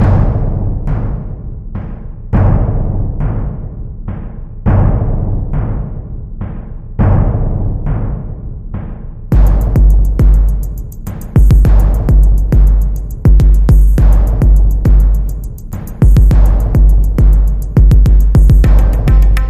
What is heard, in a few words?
Siren Sound Percussion Only